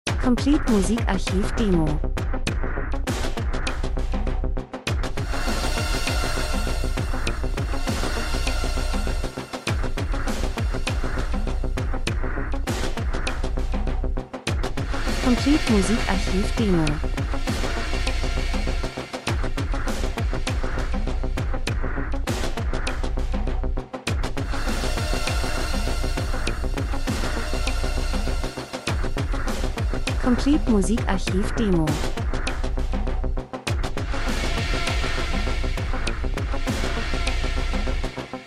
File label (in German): drums, rhythmus; Elektro Percussion Verfremdet Minimalistisch Kalt Unangenehm Wissenschaft düster Maschine Industrie Dokumentation